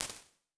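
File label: bottle hall, impulse response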